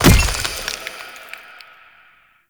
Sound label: Stone Pillar Destroy; Duviri Murmur